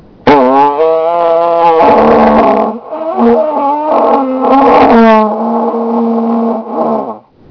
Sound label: Vuvuzela